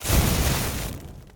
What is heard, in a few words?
ignite Burn; World Of Goo; Fuse